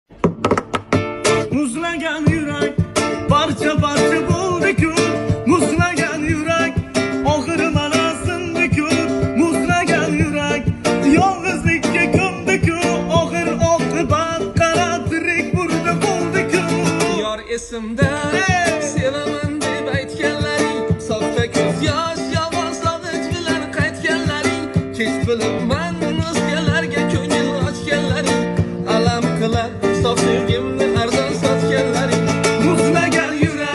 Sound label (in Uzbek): gitara